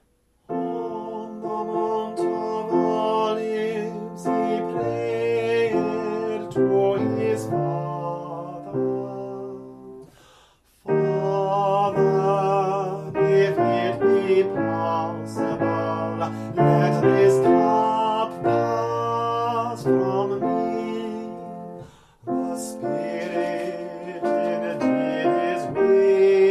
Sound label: soprano